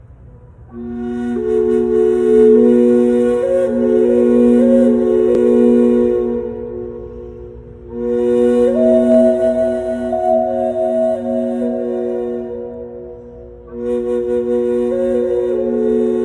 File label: Native American Flute Prayer